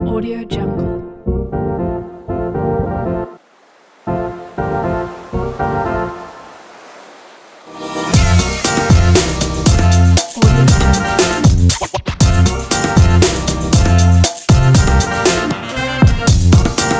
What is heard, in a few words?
summer hiphop